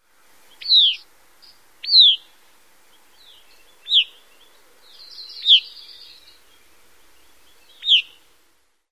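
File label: Gray-cheeked Thrush calls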